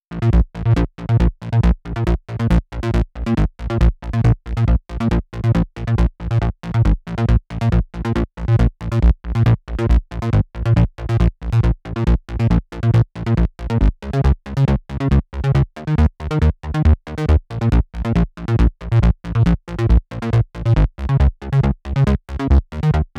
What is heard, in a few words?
Bassline 138BPM, A#minor; Vengeance Samples; Fusion Kit